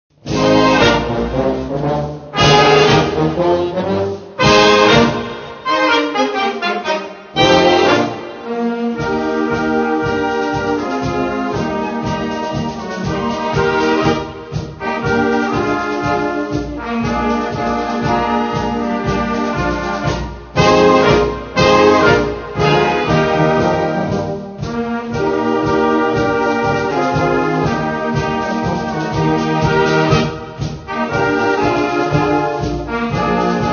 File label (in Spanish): Tangos carnavalescos